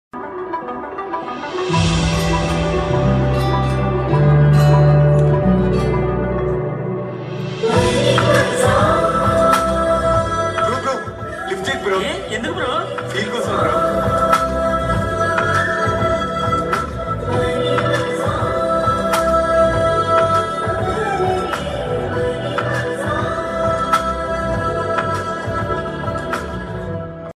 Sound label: heroine entry bgm Telugu trending songs bgm ringtones